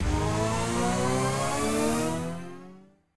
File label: respin Snd, slots; king of slots